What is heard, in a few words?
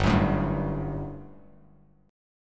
G Major 7th Suspended 2nd, Bass Standard; GM7sus2 strummed; 3,9,7,0